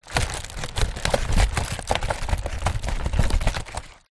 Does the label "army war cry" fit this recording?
No